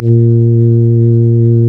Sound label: REED A#; Big Fish Audio; Synclav FM